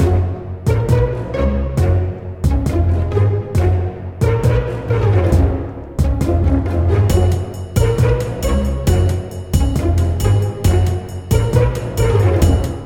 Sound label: casual funny track looped